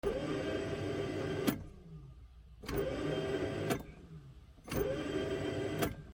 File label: 92 camry starter issue